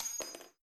50cal Land; Red Hot Vengeance

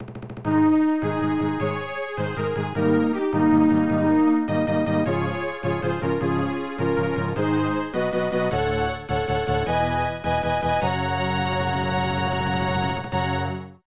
achievement; MechWarrior; BattleTech; SNES

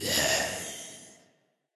Grim Cemetery Summon Moan; Fallen Ones

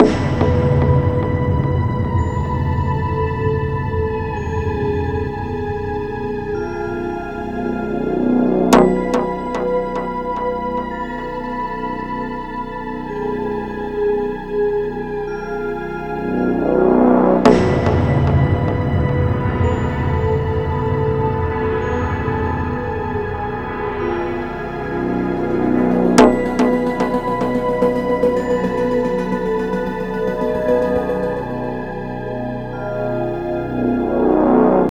Game Over loop; Star Control: Origins

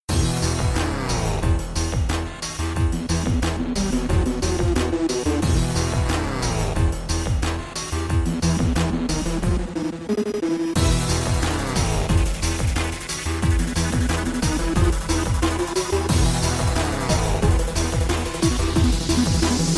Second Trumpet; Item Asylum; Roblox iOS mobile PS4 PS5 Xbox One Xbox Series XS gamerip 2020

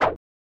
SFX Swipe; Bishoujo Senshi Sailor Moon S: Juugai Rantou!? Shuyaku Soudatsusen; SNES

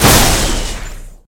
Vehicles; SFX Vehicles Manta

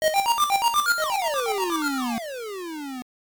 Warning; Teleroboxer; Virtual Boy

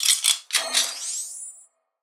Sci-Fi Weapons-Loading Fx; Robobeat

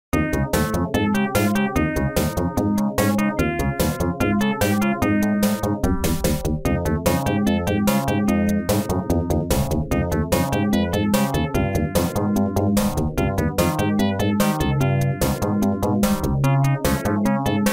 stage music

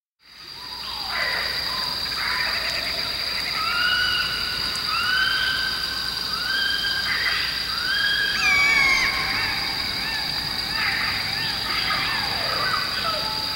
Jungle Ambience; Pitfall The Mayan Adventure

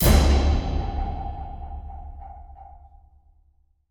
Player Fast Death

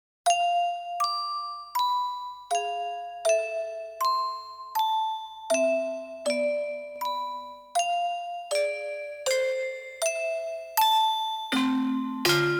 Lullaby of Darkness

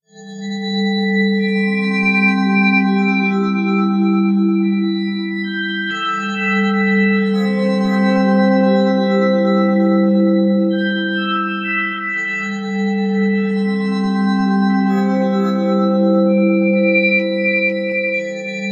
Atmosphere Chime; Terra Alia The Language Discovery RPG

